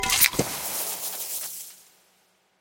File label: Soda Drink; Gween